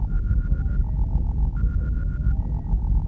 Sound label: amb access corridor; Star Wars Obi Wan; XBOX